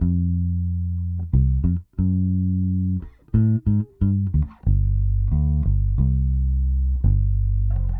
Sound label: Bass, 70s Analog Funk